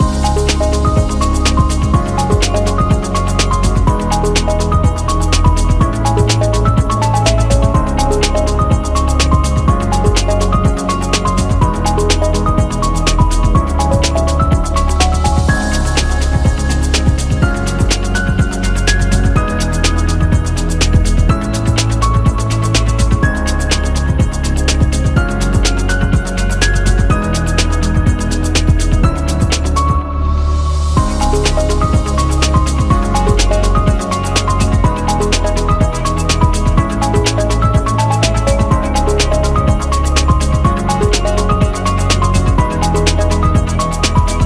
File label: Fashion Loop